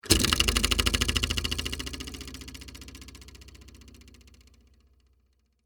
Door Stopper Heavy, Texture, Door, Stopper, Heavy, Movement; Miscellaneous Doors; BlastWave